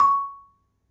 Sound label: Marimba f C#4; Misc; Terkelsen